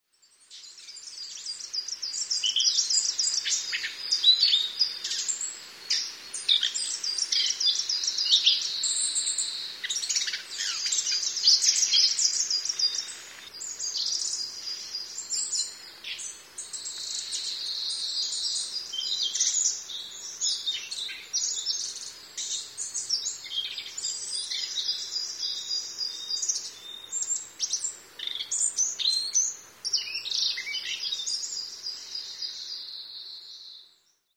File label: kosamebitaki; コサメビタキ; さえずり; 2022年5月23日 静岡県富士宮市